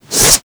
Boomerang Fold; Warframe; Weapons

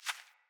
Hare Footstep; Foley

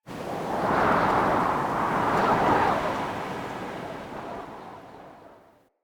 Prairie Day Wind Gust Soft

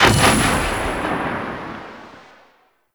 Blinded Impact; Warframe

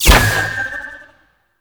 Choir Bow Fire; Warframe

